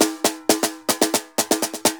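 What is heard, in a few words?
TAMBOR SALSA, salsa drums and percussion; Best Service ProSamples vol.14; AKAI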